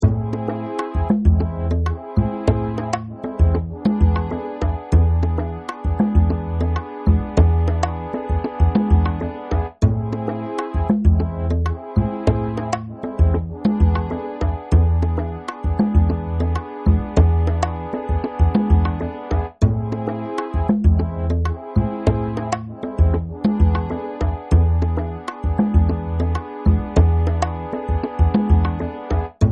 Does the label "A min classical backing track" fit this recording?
Yes